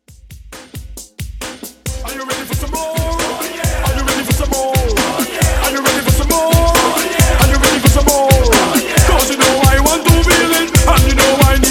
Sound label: 90s, 130 BPM